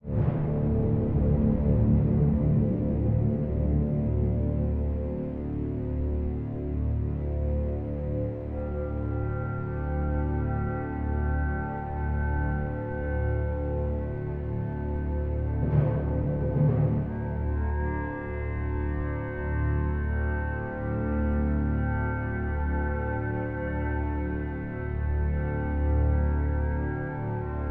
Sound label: Drone; Return to Monkey Island; Mopsaber